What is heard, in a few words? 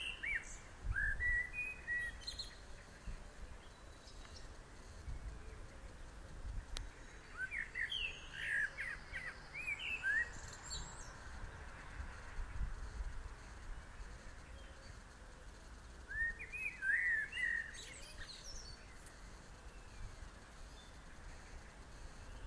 evening blackbird songbook